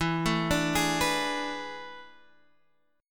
A Major 7th Suspended 2nd Suspended 4th E, chord

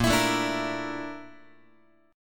A sharp Major Sharp 11th, Guitar; strummed; chordshape

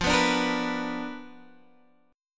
B Minor Major 7th Flat 5th, chord

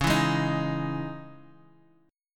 C sharp Minor Add 9th; strummed; x,4,2,1,4,x; madd9:1,b3,(5),9

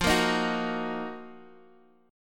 Eb Minor F; Ebm/F strummed; JGuitar

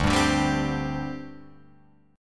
D 7th Suspended 4th, down, Guitar, Open F; strummed; JGuitar

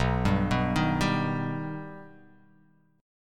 B Augmented B, chord

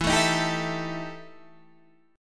E Minor Major 7th Double Flat 5th, Guitar, DADGAD

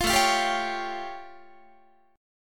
A Augmented 7th A Guitar; A+7 strummed; 20,17,20,17,17,18

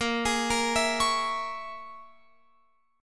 Bb Minor 7th Flat 5th, Guitar, Open F; Bbm7b5 arpeggiated

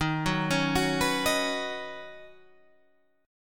Eb Minor 7th Sharp 5th Eb Guitar Open G

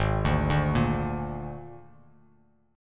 Ab Suspended 2nd Flat 5th Ab, Bass Standard; arpeggiated; JGuitar

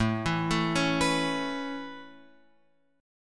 A Major Add 9th A Guitar Open F, chord; Aadd9 arpeggiated; JGuitar

